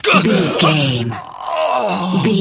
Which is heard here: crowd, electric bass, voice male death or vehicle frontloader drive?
voice male death